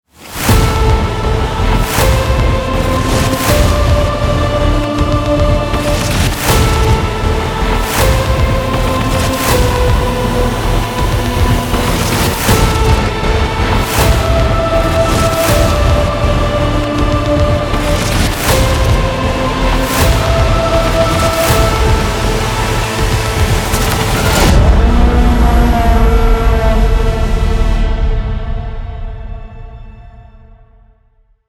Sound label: dramatic; virtual tour